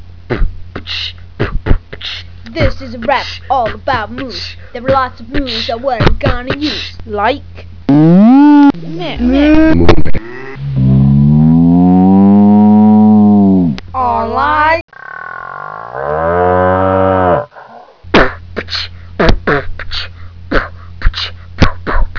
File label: moo